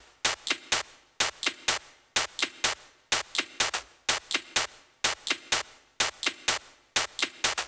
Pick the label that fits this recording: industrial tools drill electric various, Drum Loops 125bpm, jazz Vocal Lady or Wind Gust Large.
Drum Loops 125bpm